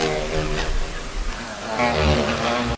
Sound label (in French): libellule; MOBICLIC155